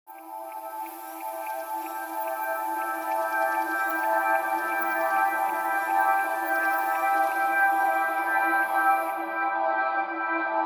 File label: ambient